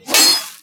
swing hit world; weapons; darksouls

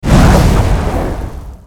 dash

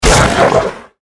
watcher death

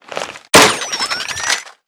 alien disintegrator reload

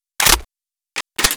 microwave reload

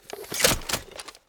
flamethrower reload

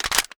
mag in, weapons

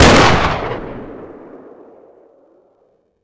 apc fire, simulated vehicles weapons

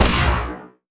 sentry laser; snds jack gmod

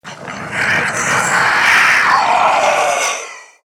alert, zomb female; nmr

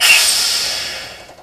air brake; vcmod

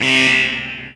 turret warn

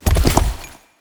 horse run

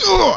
death

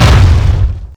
step

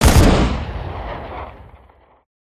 gunstand machinegun fireloop; gow

